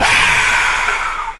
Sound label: "ah" exhale; Voice; ooh ahh